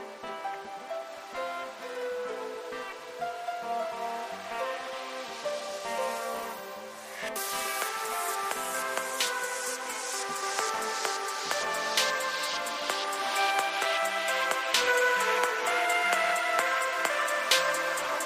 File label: Happy Birthday Instrumental